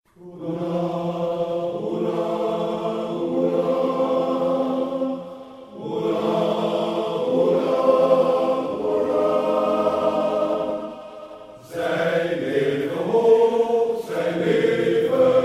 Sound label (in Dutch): hoeraaaa haha